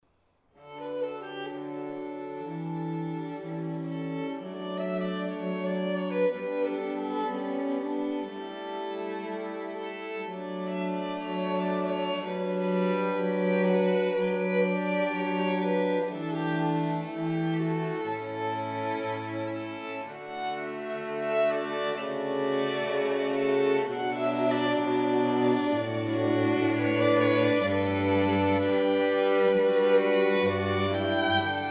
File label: Chamber Recital Hall; string quartet